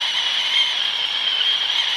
whistle, kettle; cooking; Votive 1.21.1; Votive Content

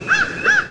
caw, crow; Votive 1.21.1; VotiveItemsAdder; votive crops

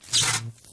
electric sound two; Minecraft; Votive 1.21.1; Votive Content

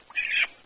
raccoon idle; animalsvotive; Minecraft; Votive Content

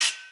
sword rain; Minecraft; Votive 1.21.1; Votive Content

